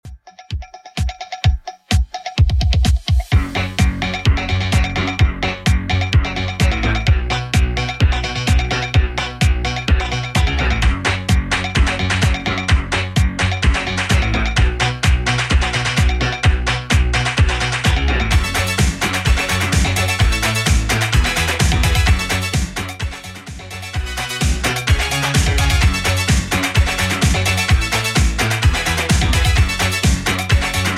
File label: 80s Redrum 128bpm